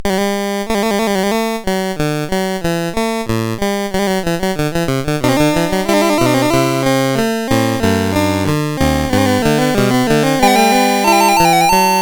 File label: Goldberg Variations parts 8-13 sid CSG8580R5; hvsc